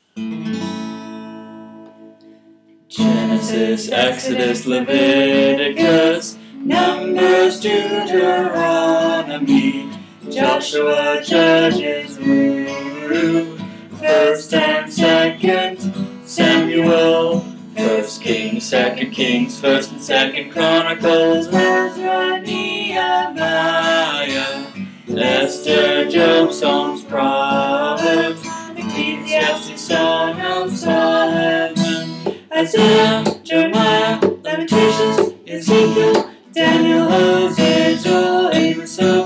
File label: Bible Books Song Sing Guitar; Bible Books Song KJE